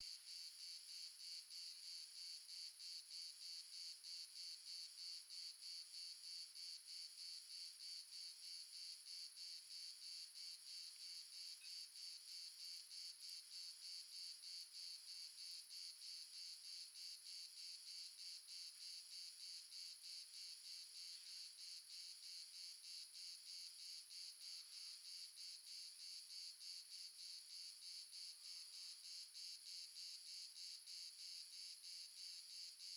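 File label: ambience crickets multiple south france; RedSonic; Crickets and Frogs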